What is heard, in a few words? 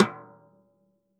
stickshot snares off; JazzFunkKit